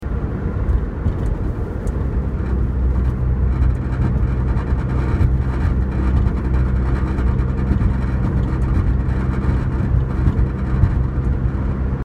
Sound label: blue coupe not normal